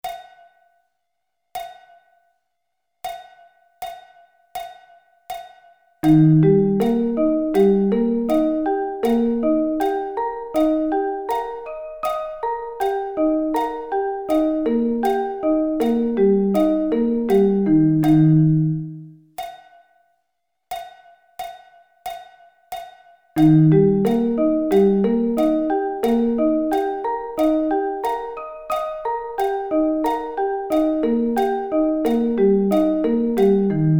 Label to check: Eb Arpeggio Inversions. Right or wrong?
Right